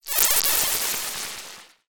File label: Sci-fi Transition